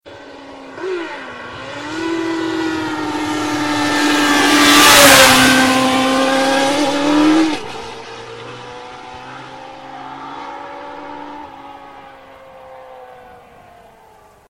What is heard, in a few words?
Honda Sound